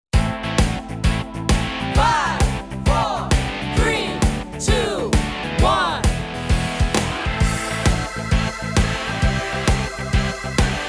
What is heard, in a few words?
Rock Organ; dance-rock